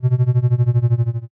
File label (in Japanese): wanawana